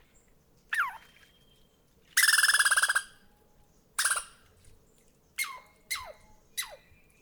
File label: squirrel chatter; goodnight jellybean